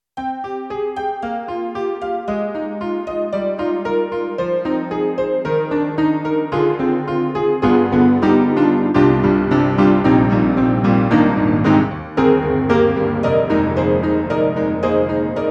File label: EDIROL SD-80, Old Honky